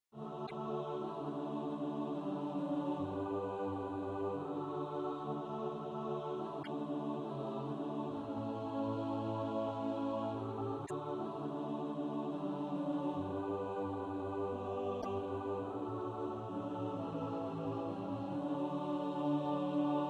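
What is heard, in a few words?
alto; He Hideth My Soul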